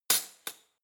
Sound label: cutlery spoon drawer throw